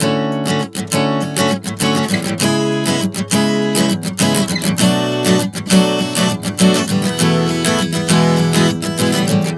Dmaj7-C#m7-Bm7-E11-E 100 bpm, acoustic guitar chord progressions